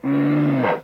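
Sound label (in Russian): оглушающий натужный рёв медведя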